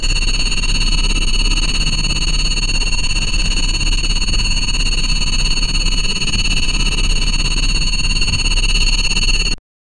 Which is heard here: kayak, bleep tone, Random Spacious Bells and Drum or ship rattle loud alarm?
ship rattle loud alarm